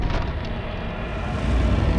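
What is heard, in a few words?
Music Volcano 120; From Dust; ps3; xbox 360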